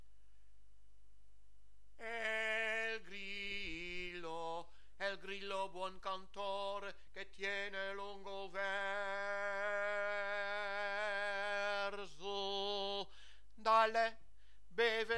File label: el grillo sopraan